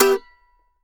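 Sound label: mandolin, minor; Sampleheads; Whole Lotta Country 1 & 2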